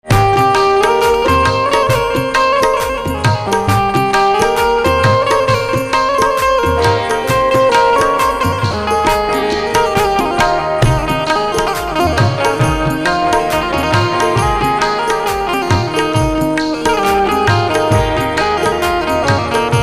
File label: Banjo Instrumental Ringtone